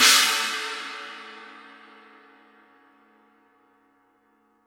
rude 18 wild china edge, paiste

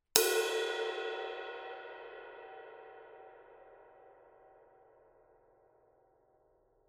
master 20 dry ride body, paiste; masters

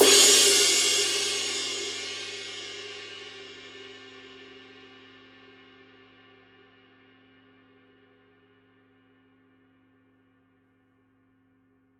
formula 602 22 med ride edge; paiste; classic sounds

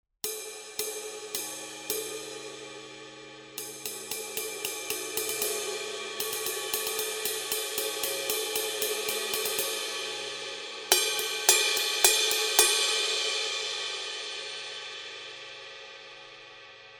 paiste signature 20 mellow ride pattern

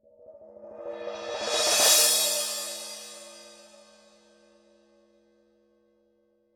14 medium crash roll, paiste; pst 5

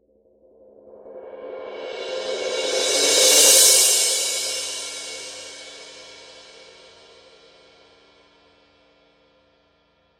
rude 19 wild crash roll, paiste

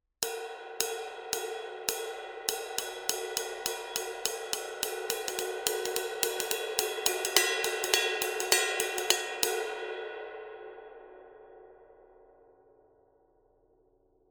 20 extra dry ride pattern; paiste; masters

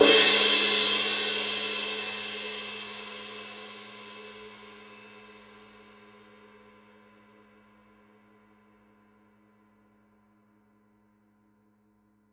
21 medium ride edge; paiste; masters

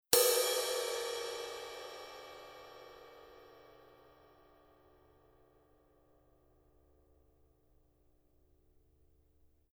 giant beat 18 multi body, paiste

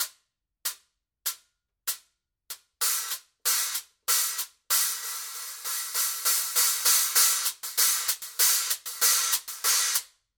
paiste pst x 10 swiss hats pattern

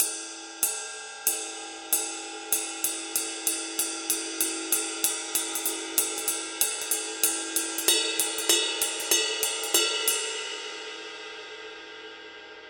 power ride pattern; paiste 2002

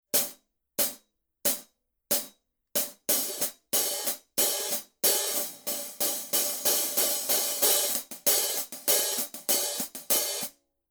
16 hi-hat pattern; paiste; giant beat

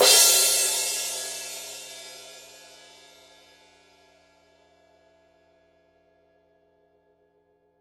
18 heavy crash edge, paiste; pst 7

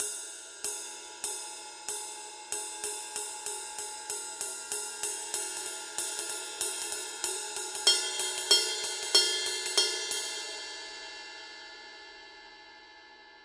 22 heavy ride pattern, paiste 2002

